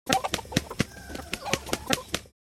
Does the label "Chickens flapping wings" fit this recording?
Yes